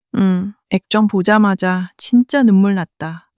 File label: crying